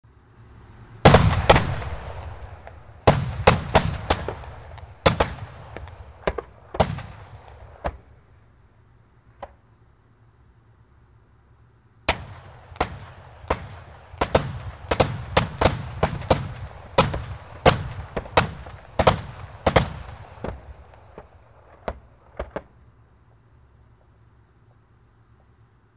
tank; Стрельба из танковой пушки и пулемётного орудия

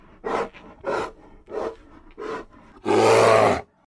grizzly

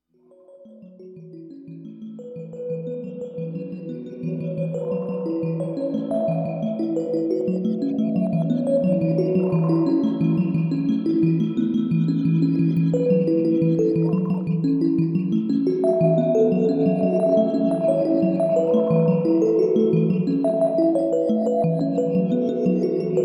fairy, volshebnaia muzyka dlia fona bez slov

